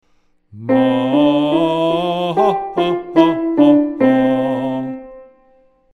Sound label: Slur into Ha ha